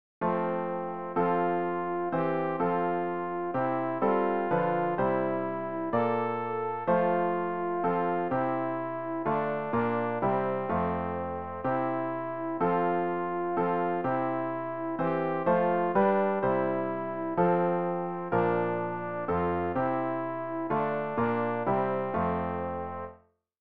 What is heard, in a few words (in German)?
alt, Übehilfen für das Erlernen von Liedern; ref gesangbuch; rg 211 der du die wahrheit selber bist